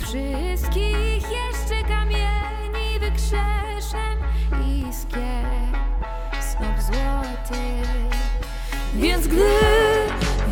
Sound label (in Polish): vocal drugi glos; "Bella ciao"